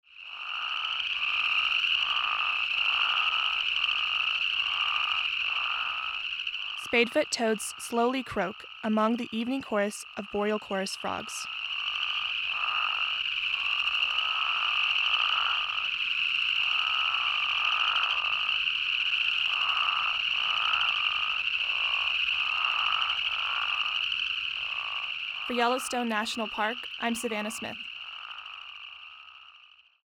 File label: spadefoot toad; sound and light ecology team